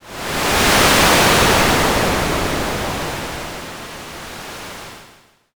wind

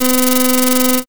slot spin

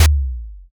taiko

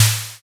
snare

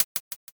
hihat

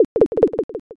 slot stop

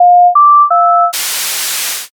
modem

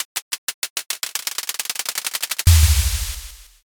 drumroll

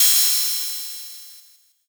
cymbal

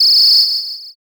whistle long

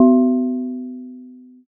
gong

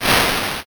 swoosh

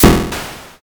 thunder magic

